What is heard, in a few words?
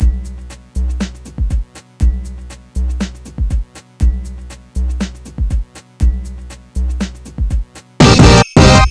Victory; N+ soundtrack; DS PSP